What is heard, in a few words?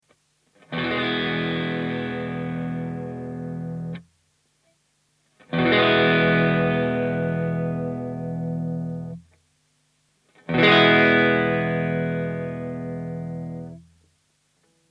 bbp comp 3 mode